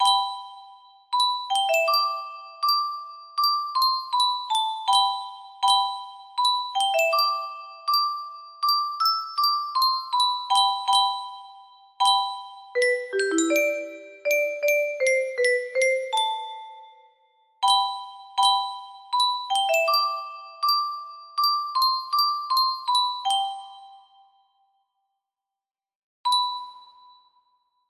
pretty melody calm sleep, music box melody

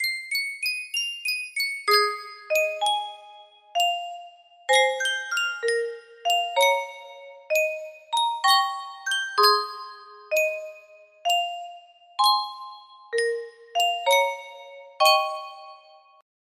Sankyo music box, music box melody

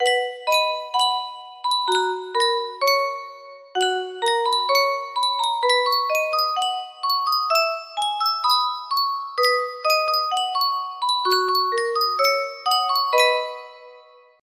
yunsheng boite a musique, music box melody

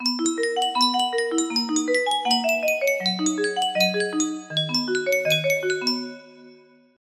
music box melody

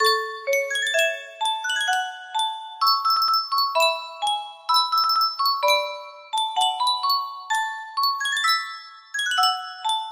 Yunsheng music box

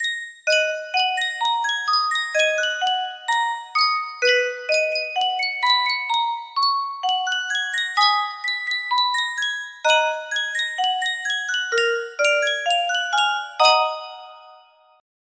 music box; yunsheng custom tune

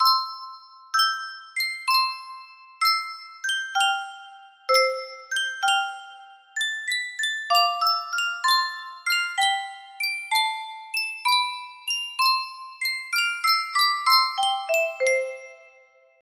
Yunsheng custom tune music box

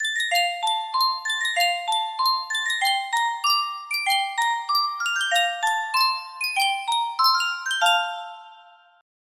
Sankyo miniature music box

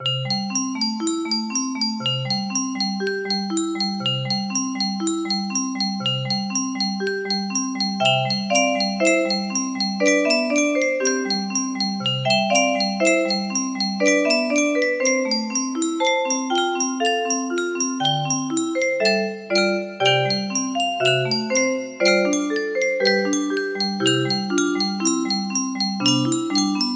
melody, music box

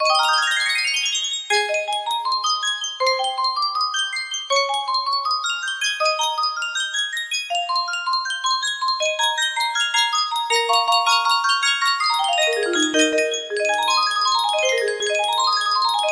piano

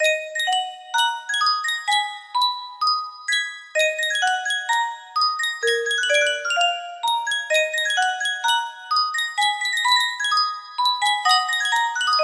music box; yunsheng custom tune

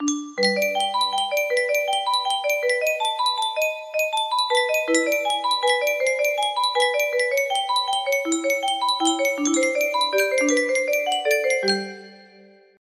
f scale, music box melody

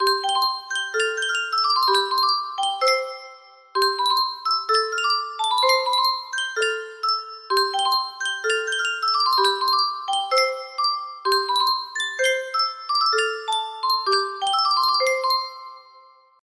Yunsheng Music Box, Unknown Tune

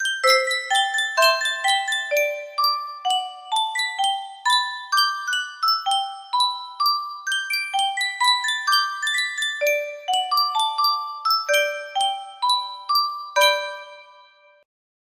Yunsheng custom tune music box

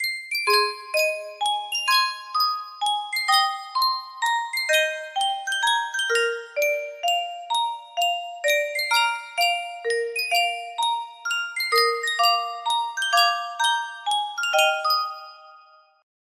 sankyo music box

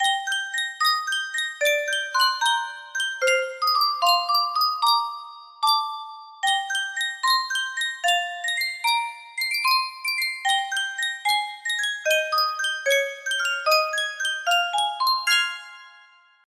sankyo music box, music box melody

